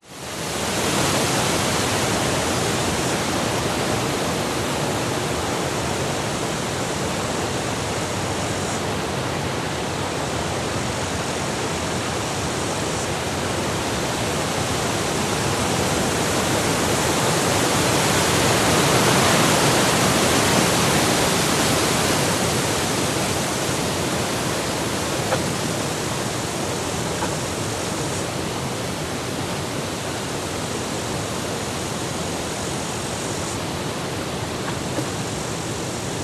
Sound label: wind trees forest light insects summer australia; nature; zapsplat